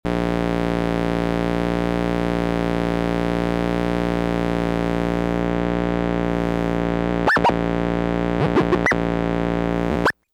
bleep out

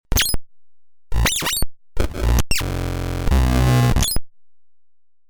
alien first words super speed

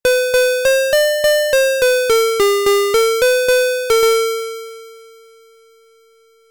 micro cosm piano

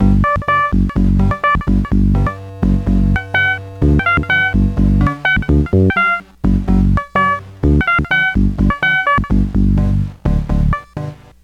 noodle; pss-140

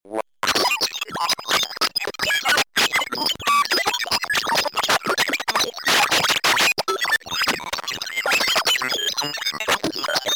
whiz-kid machine spazz

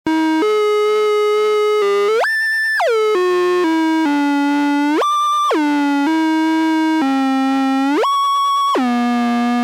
vibrato pitch up

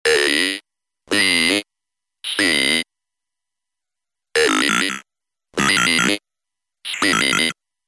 vibrato

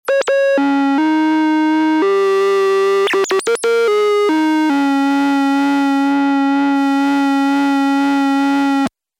vibrato hyperdrive lowpass

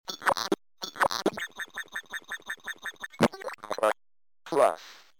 whiz-kid loops

